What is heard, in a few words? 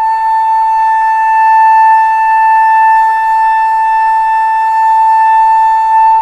A5 flute